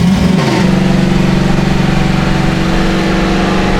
jeep second

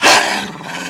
attack hit, dog

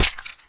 glaive catch

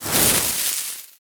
tnt ignite

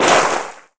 step skid

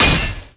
impact generic single

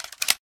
insert shell, weapons; bulkcannon

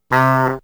horn

